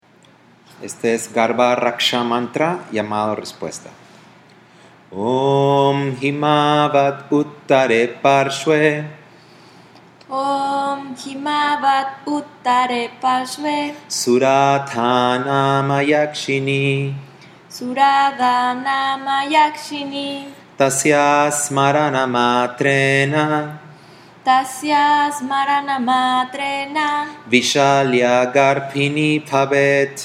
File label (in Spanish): Mantra Llamado Respuesta; Garbha Raksha Mantra